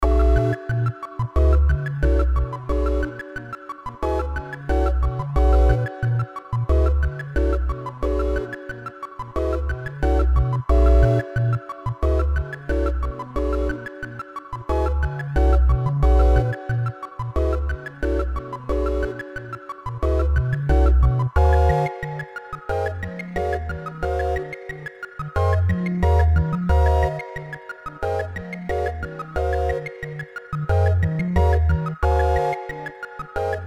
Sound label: sine wave